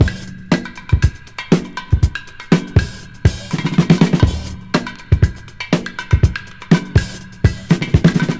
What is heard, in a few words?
114 bpm drum loop sample d sharp key